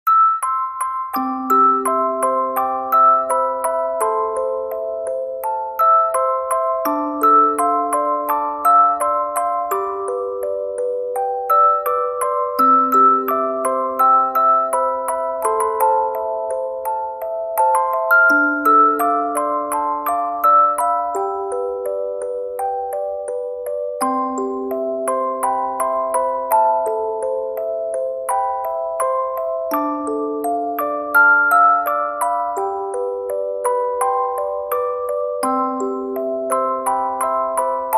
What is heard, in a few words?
Music Box